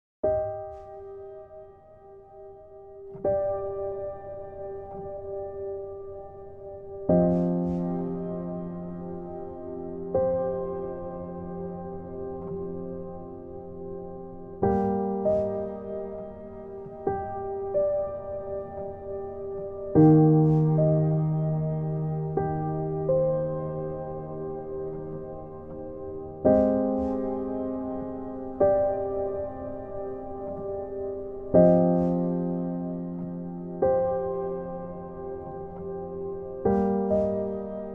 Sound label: Soft Piano